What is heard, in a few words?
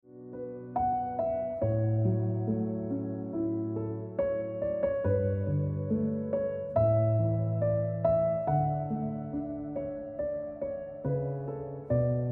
Piano Music